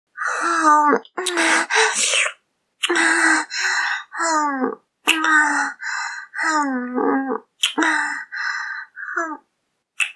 濃厚, ASMR, キス

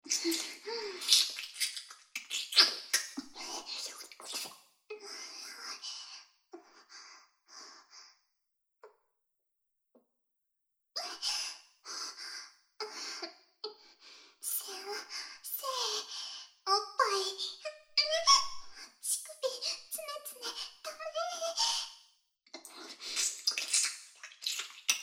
お家のお風呂で 環境音有り, ASMR; お家のお風呂でフェラチオ＆騎乗位中出しトレーニング

